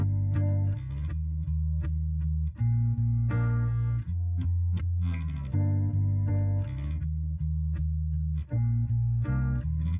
no drums, inst

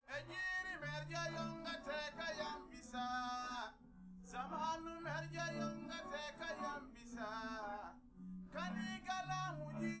no drums live rec

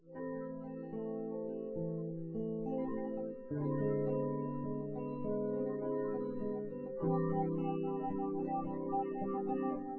nodrums synthconv 250ms 5cm src

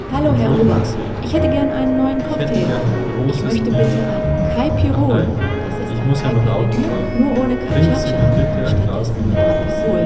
Cafeteria

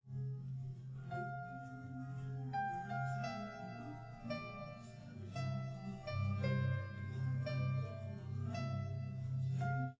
nodrums liverec 250ms 5cm; SiSEC11; underdetermined

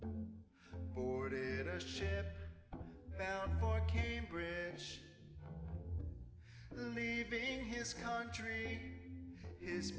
nodrums inst sim

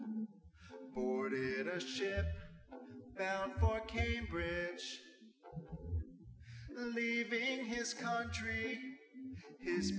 nodrums; SiSEC08; SiSEC underdetermined

